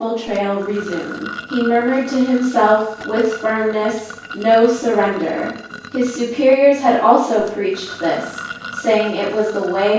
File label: drinking water